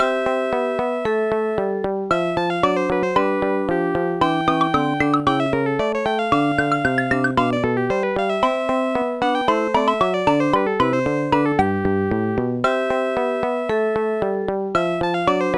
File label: air; Sonix Music Driver